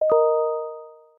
outlook notif; owa

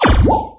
teleportation

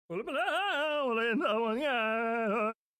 rain dance; globox

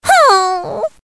sigh; amy rose

